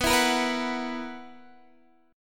B Major 7th Suspended 4th B down Guitar DADGAD

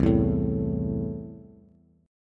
C 6th C down Bass; C6 strummed

